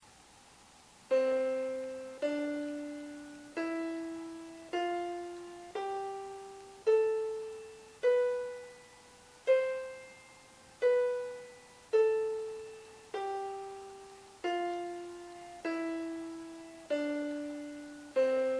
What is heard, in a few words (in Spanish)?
escala